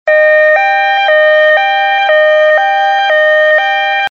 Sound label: ambulance notification tone